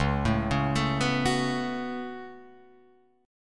Db 7th Suspended 4th Sharp 5th Db down Guitar Open F; JGuitar